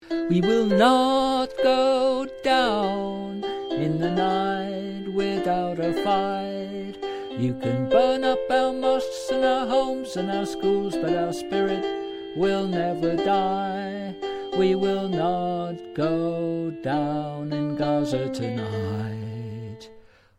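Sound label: Chorus Soprano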